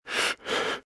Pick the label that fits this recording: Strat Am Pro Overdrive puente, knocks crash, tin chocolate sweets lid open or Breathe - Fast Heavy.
Breathe - Fast Heavy